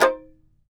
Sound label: TAR; Roland - LA Composer vol. 3